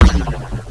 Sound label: saw fire